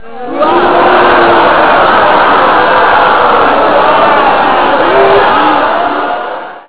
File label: boo